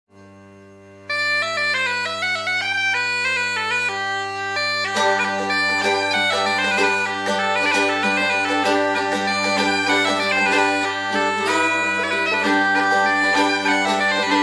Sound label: menuet